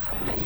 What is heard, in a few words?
Slerp Loop